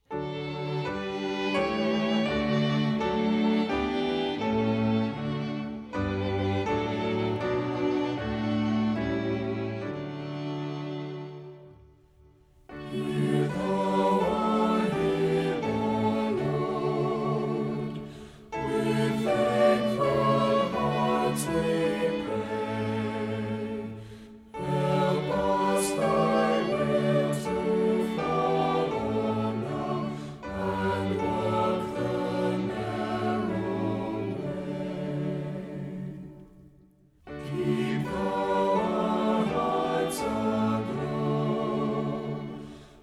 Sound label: hear thou our hymn o lord vocal and instrumental, hymns